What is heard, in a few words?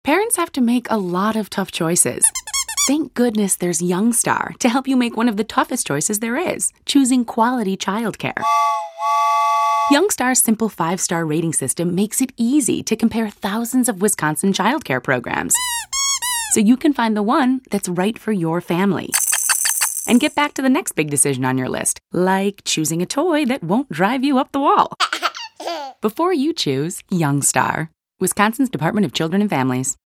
squeek